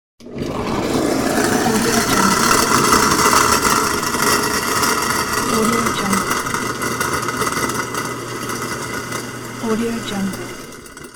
old loud kitchen garbage disposal

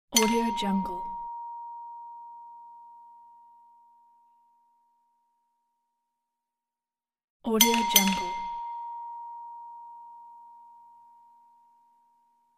service call

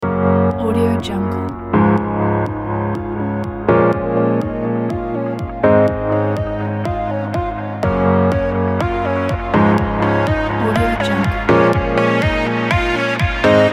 pop style corporate background pack

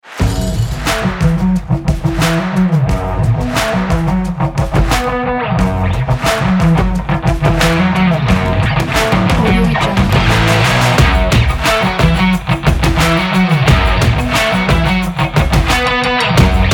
action sport rock

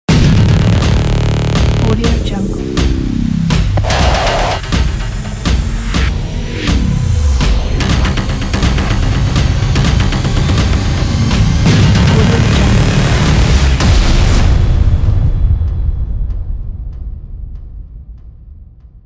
cinematic logo ident; kipaloops

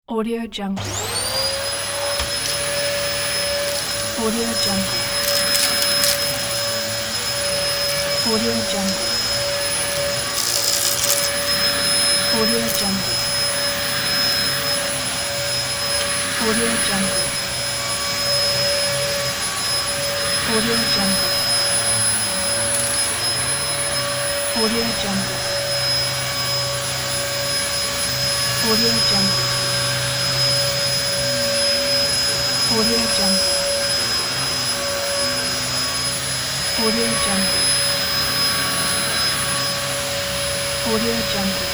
vacuum cleaner

